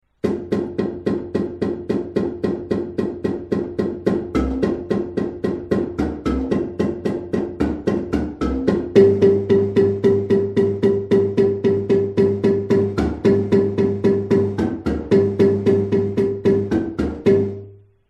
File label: bass intro, marimba